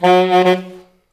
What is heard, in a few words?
SAX A. STAB, Alto Section; Roland L-CD702